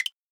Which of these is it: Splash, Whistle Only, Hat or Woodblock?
Woodblock